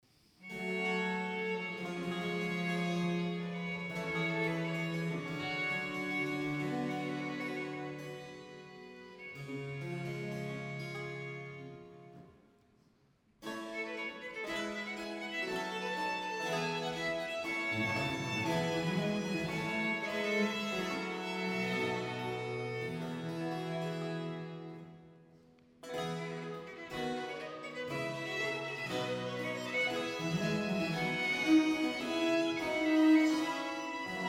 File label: Sonata in Bb Major Adagio Allegro; Corelli; Op 1 5